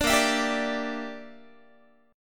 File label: A sharp 6th Flat 5th, Guitar; A#6b5; strummed